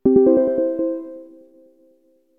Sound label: Option Confirm; GameCube System Sounds; GC